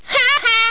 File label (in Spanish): jaja; simpsound